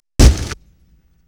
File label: Explosion, 5.1 Surround